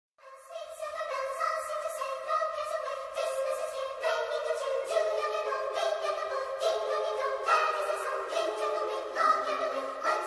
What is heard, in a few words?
CAROL OF THE BELLS Trap Remix